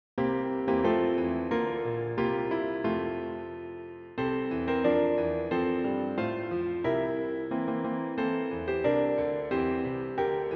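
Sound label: piano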